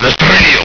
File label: shot